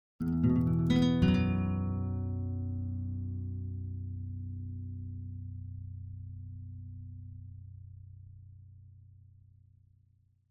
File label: Acoustic Guitar - Acoustic Guitar Arpeggio - E Minor; Hollywood Edge; sound designer's toolkit v2